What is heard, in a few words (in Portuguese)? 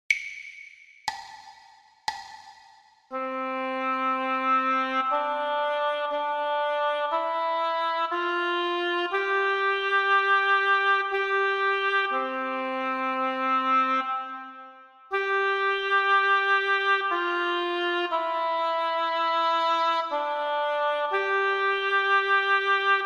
percepcao ditado